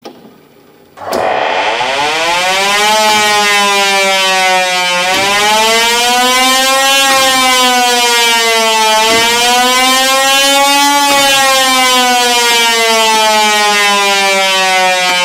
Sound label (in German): ABC-Alarm, Sirenensignale